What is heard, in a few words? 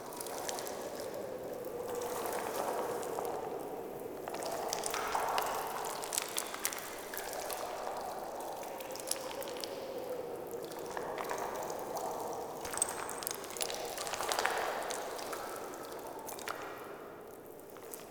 Cave Loop A, Ambience; Warframe; Eidolon Plains; Eidolon Landscape; Caves